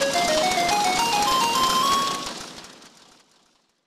Hit Jingle; Tanokichikun 2; Pachinko Maruhi Hisshouhou; SNES

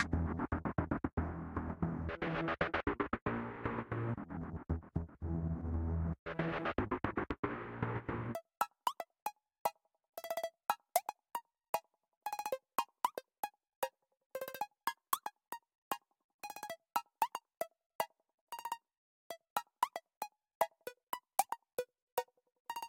Mix Stem Layer; quantum recharged gamerip 2023

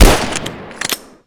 MOSIN; blockade classic 2014 gamerip

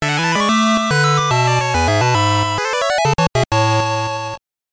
jingle; Umi no Nushi Tsuri 2

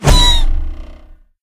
Pigs Potion Inflate; Angry Birds

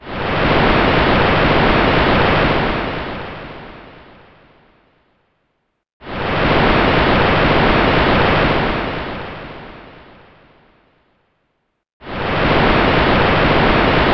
Waves Ambience; Spyro Attack of the Rhynocs; gba

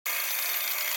Saw Loop; Tank Stars; android

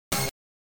pickup tone

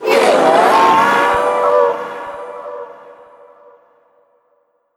Impostor Screaming; Among Us

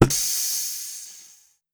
Panel O2 Plug Out; Among Us

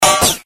Bullet Impact Metal; Pain Train

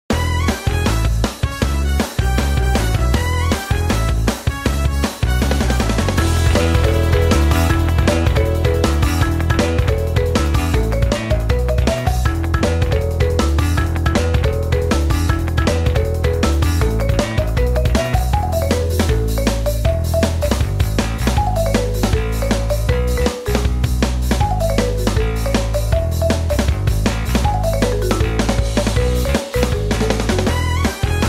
Race Win; crash team rumble ps4 ps5 xbox one xbox series xs gamerip 2023; Top Performer Music; CTR NF

